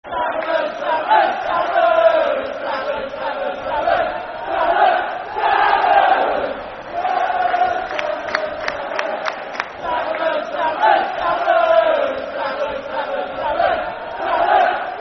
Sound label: Saddlers, Saddlers, Saddlers, Football Chant Soccer Song; Walsall Wfc; fanchants